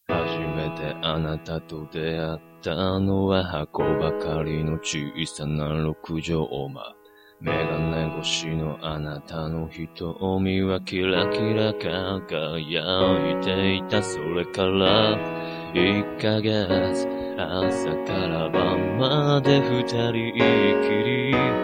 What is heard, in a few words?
rainbow piano